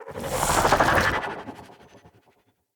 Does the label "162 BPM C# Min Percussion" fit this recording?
No